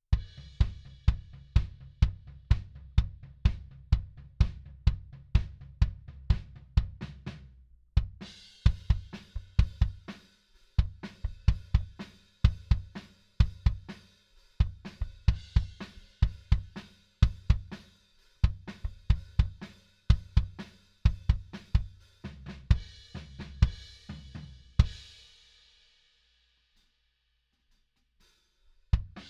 Kick

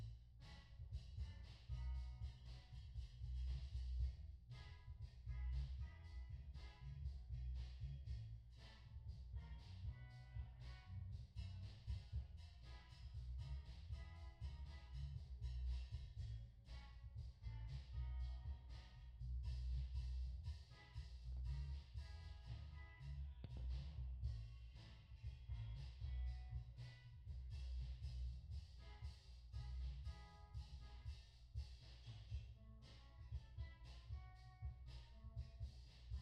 Bass Mic

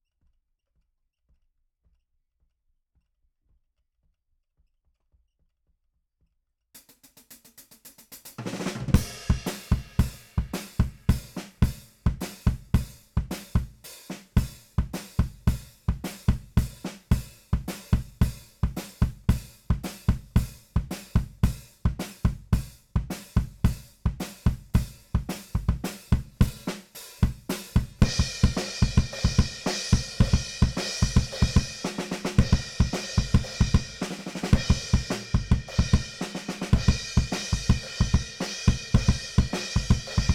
BD Out

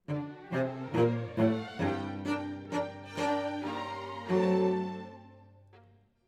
Cello Solo

